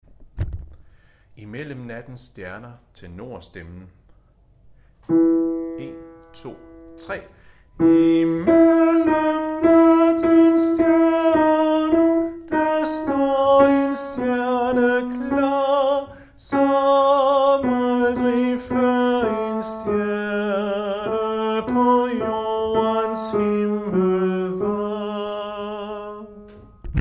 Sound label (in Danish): tenor; harlev koret